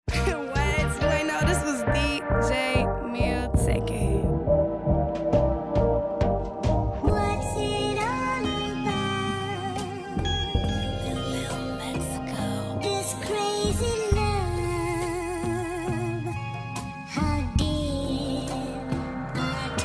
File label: Instrumental